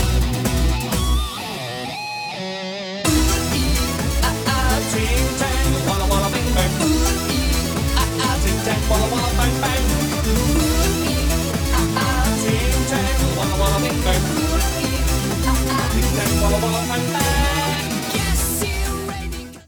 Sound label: 128BPM